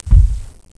bump

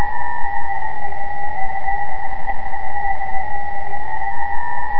wind

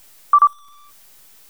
drone beep

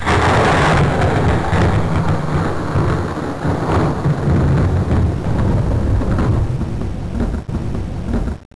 storm slow; baseq2

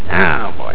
bump

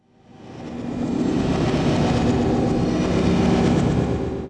metro

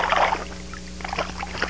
DROWN

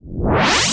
monster spawn; medic commander

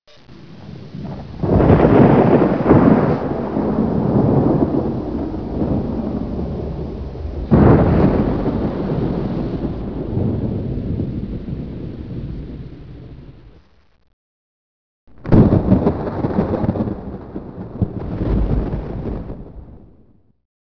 thunder; beach

